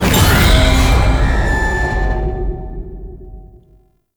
Floor Complete Stinger; Tau; Tau Circles Of Hell

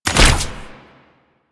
Bow Shot

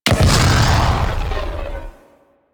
Arch Gun Alt Projectile Explosion; Warframe; Archwing; Hildryn

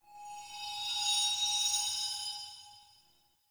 Ancestor Tree Spirit Chimes; Soul Plane

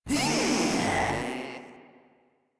Proto Infested player spotted; Warframe; InfestedNinetyNine

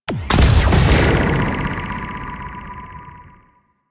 EMP Explosion; Warframe; Fortuna; Venus

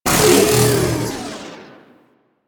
Proto Infested Mutalist Death, Vocals; Warframe

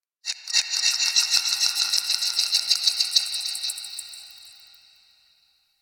Texture Small, Ambience; Sentient Lair; Warframe

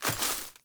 Grass Step; Characters; Ambient; Steps; Sprout Farmers

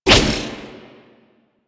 Necramech Melee Thrust; Enemies; Warframe

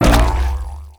Mushroom Bounce Impact; Warframe; Nokko

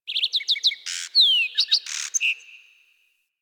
Zone Tree Critters, Ambience Plains

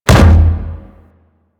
Pilot Breakout Hit One; Warframe; Amalgam Carrus

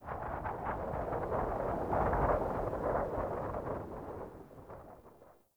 Fort Wind Gust Low Mid Howl; Ambience

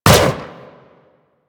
Sentient Laser Fire; Warframe; Sentients; Symbilyst; Weapons

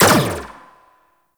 Energy Rifle Fire; Warframe; Weapons

